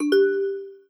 transfer confirm

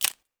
dynamic box open

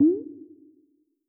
status online

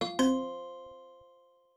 new conversation

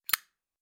loading ends

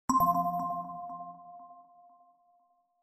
fw question

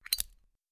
loading starts

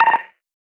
short start

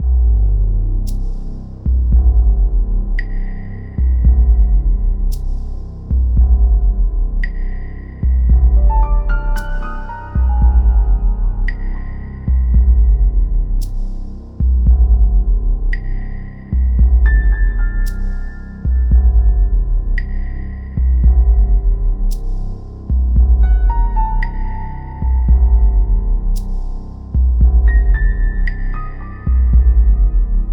background; horror

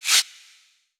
slide open short

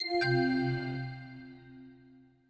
logoff

